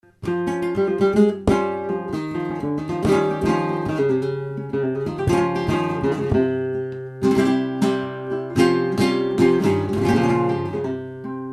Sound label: tiento, flamenco